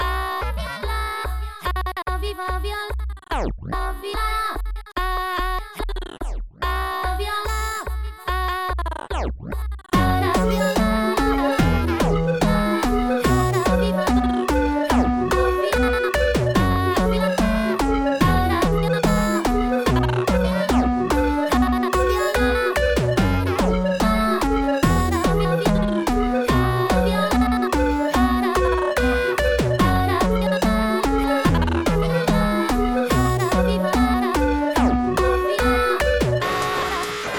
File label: vocal